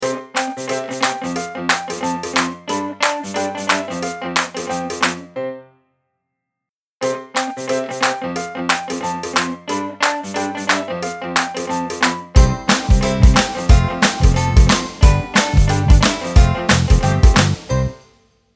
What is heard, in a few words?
Happy Sound Logo